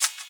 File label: shaker sound sample C# key, Royality free shaker sound tuned to the C# note; percussion